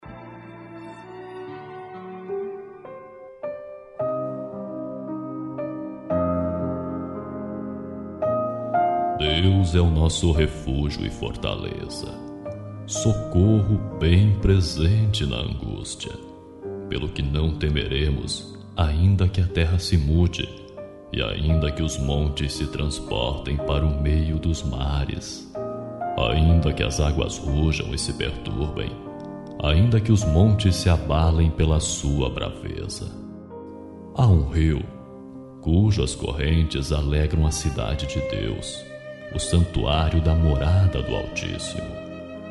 salmo 46 na voz

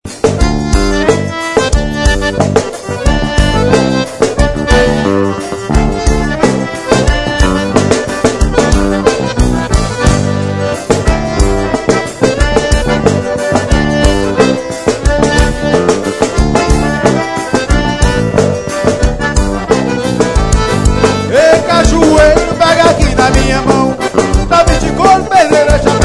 acordeon

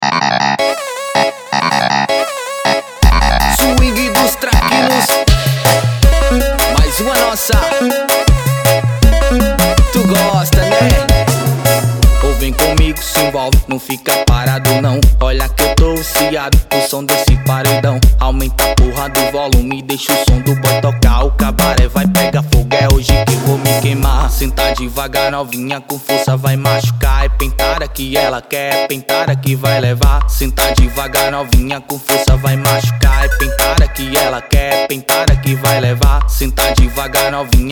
swing

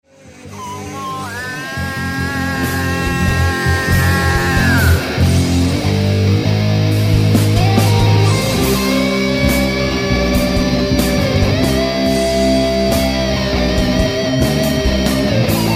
solo

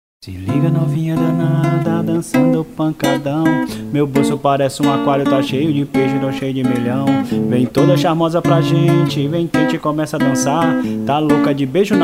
provocacao vox e violao reverb

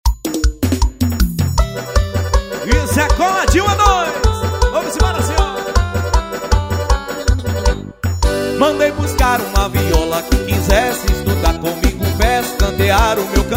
viola viola

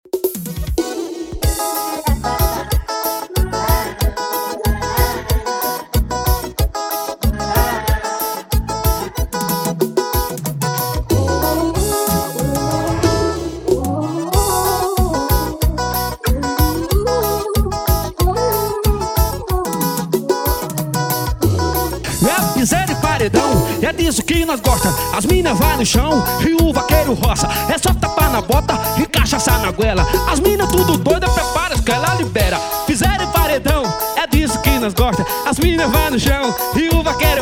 piseiro paredao

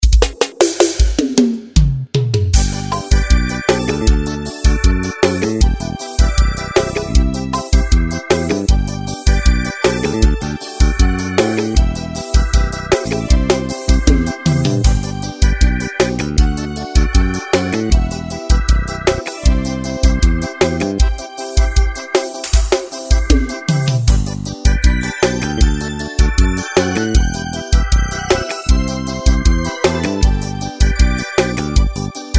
forro romantico

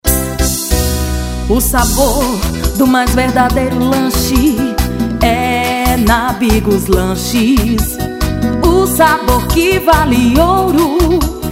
jingle lanches; bigus lanches

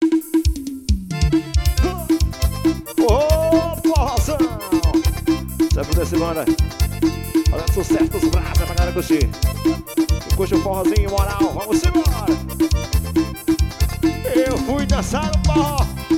teclados, forro

